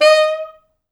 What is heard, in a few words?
D#4, Pop Brass; Best Service ProSamples vol.19; AKAI